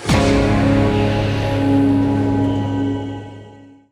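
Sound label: Jingle MISSION FAIL; Bakugan Defenders of the Core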